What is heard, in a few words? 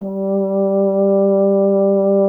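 French horn; Miroslav Vitous; Solo Instruments VOL-2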